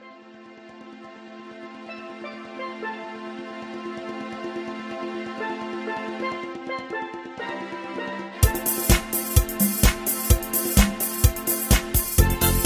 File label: Patter